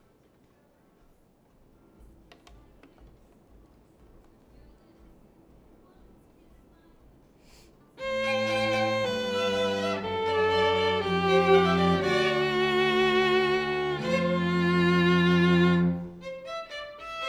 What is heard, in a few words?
violin